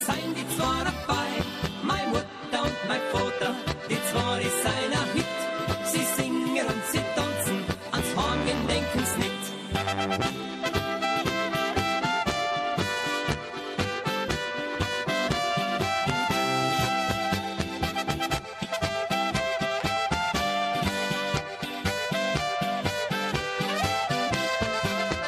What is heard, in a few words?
austrian folk music ringtone